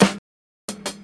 big room snare single hit g key, acoustic snare tuned to the G note; snare drum samples